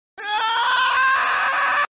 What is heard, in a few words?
external screaming; Memes